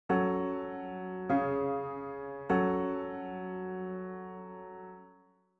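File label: F sharp minor PAC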